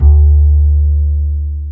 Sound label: DBL BASS D2; AKAI S-Series CD-ROM Sound Library VOL-2